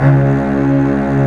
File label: string; Roland LCDP13 String Sections; STR Combos 1; CMB Arco Marcato